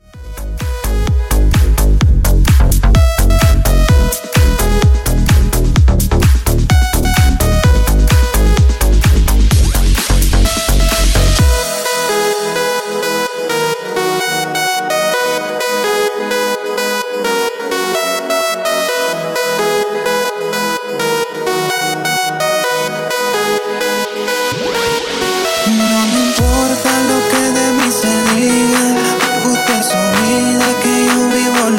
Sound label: Epic Intros Mashup 128 Bpm